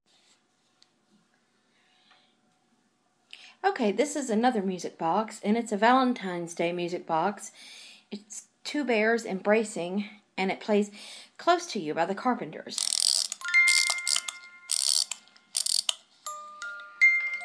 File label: valentine s day music box